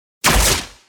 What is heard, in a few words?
invincible off; ragmega